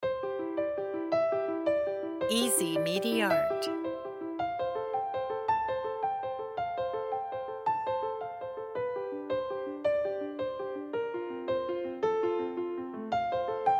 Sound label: piano inspiring theme